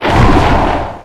salt

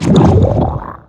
slime

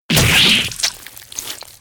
sword

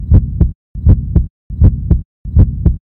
heartbeat

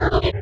poison

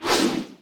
dodge

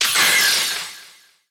mirror

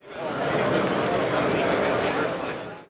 bazaar

